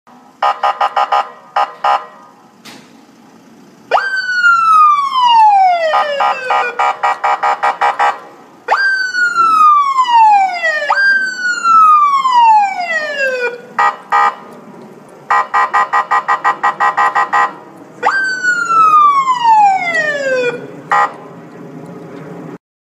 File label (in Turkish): Karisik Sesler HD Polis Sireni